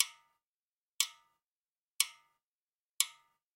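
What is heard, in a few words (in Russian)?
простой цикл 120bpm с использованием только минимнот половинных нот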